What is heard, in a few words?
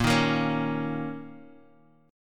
A sharp Minor Double Flat 5th, A sharp, down, Guitar, Half Step Down; A#mbb5